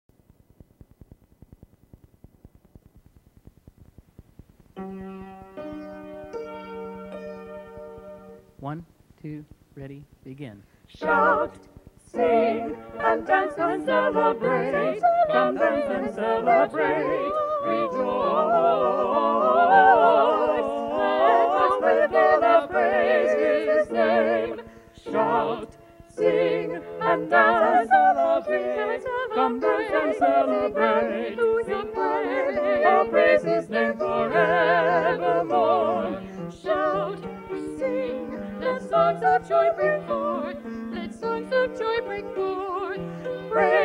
praise the lord all voices, choirs

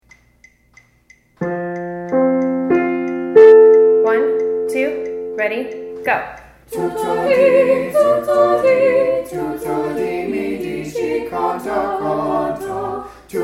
all voices, choirs

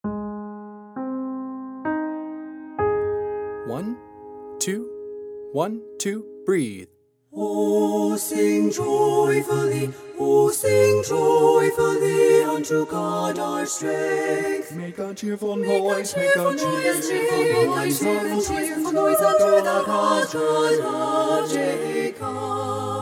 o sing joyfully tenor mute, choir

